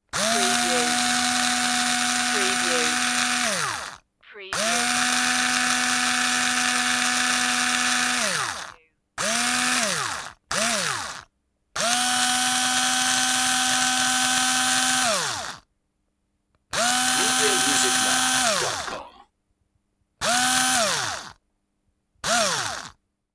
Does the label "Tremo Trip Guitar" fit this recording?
No